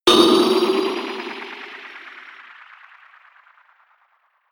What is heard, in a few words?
pitched wobbler, impacts; hits explosionen